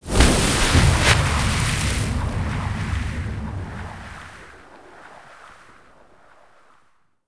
Water Enter; Quantum Redshift

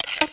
reload m79; weapons; Max Payne

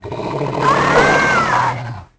Nord attack F; The Elder Scrolls III Morrowind GOTY; XBOX

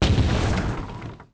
coll ship 2 rock; shipcharge; Pirates Of The Caribbean

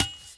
hand grab metal

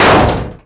picnic table hit; bouncyobjects; Tony Hawk's Project 8; XBOX

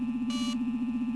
amb torpedo; Star Wars Knights Of The Old Republic II; XBOX

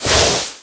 revert grass, skater; Tony Hawk's Project 8; XBOX

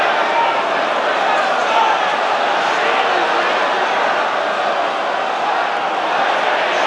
Small Idle, Crowd; David Beckham Soccer; XBOX

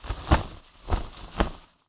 sails, sea; AMBIENT; Pirates Of The Caribbean

